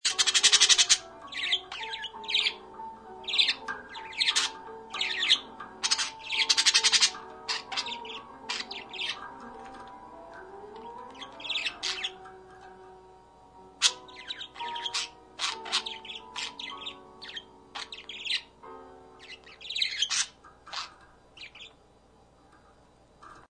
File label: parakeets